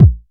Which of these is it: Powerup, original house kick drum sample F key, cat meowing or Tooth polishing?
original house kick drum sample F key